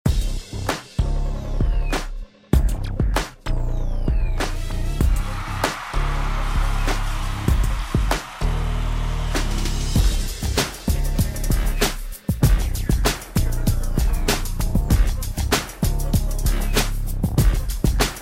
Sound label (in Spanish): instr